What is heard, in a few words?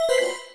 general warning; Nokia; 770 Internet Tablet